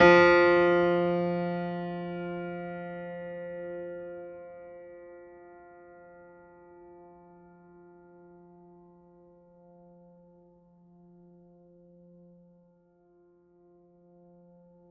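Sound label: Classical Piano F3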